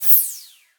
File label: garbage out small; tetrio